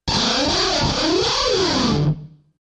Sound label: Solo Guitar Riff